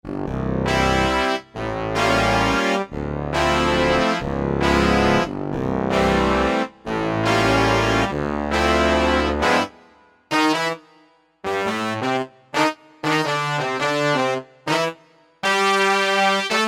The Big Brass, synth

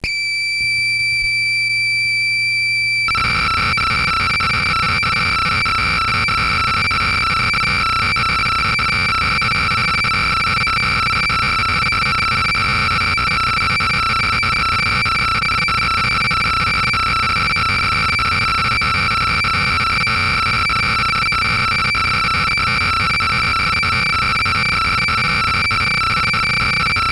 Roland Juno-60 factory programs

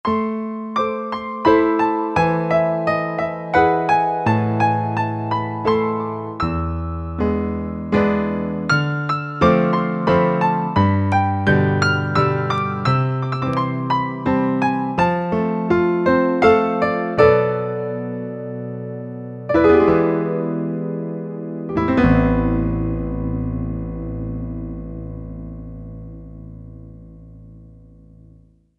Warm Grand

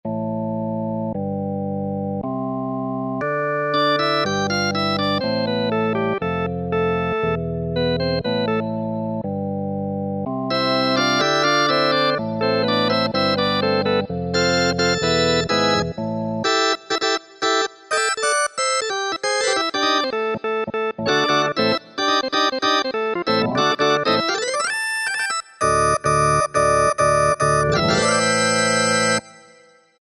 B3 Org shine; Sonic Reality Modern Songwriter

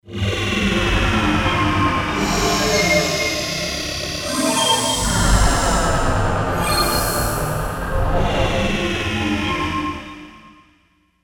Disembodied, E-mu Proteus-3; Factory patches

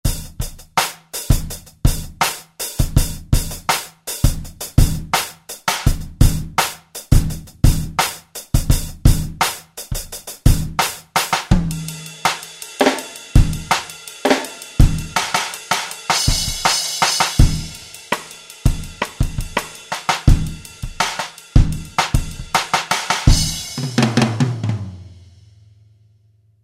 HipHop KIT; Roland SRX-03 Studio SRX

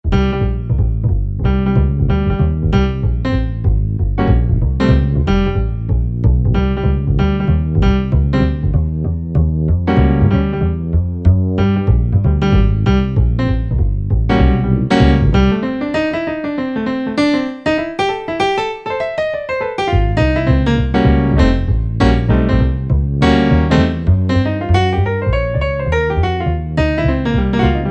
bass piano, Korg N364; Factory Combi demos

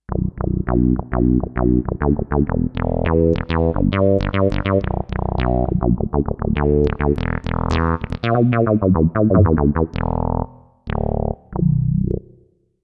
AnaKnobs, Kawai K5000S

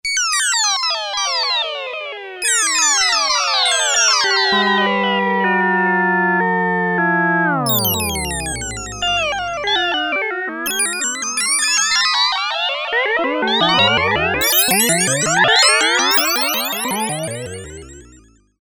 Descending Pulse Wave Mod; Sequential Circuits Prophet-5 (Ver.3); Factory Patch

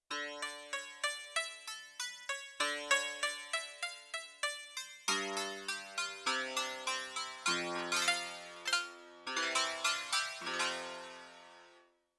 Piano, Yamaha CFIII Grand Piano; Yamaha TX16W

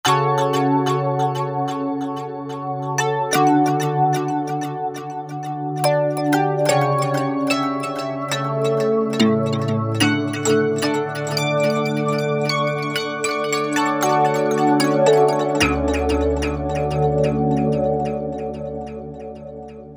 2 voices; Korg MSC-12S Synth 3

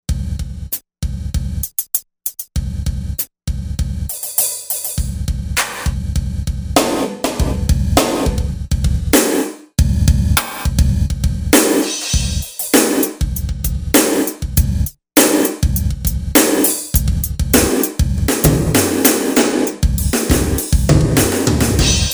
Gate Drum, Korg M1Rex; Factory Patches

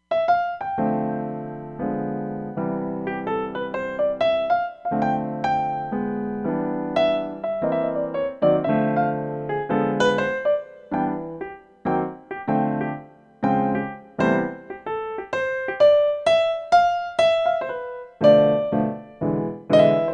Studio Grand

